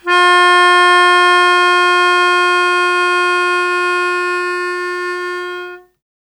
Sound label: Melodica F Hit